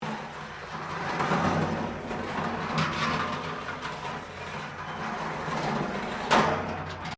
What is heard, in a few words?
METAL BIN SCRAPE WIRE FENCE; Metal (Sound Effects:Materials)